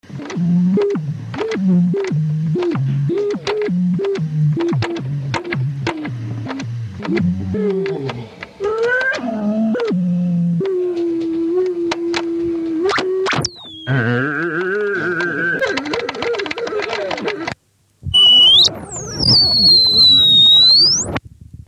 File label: Theremin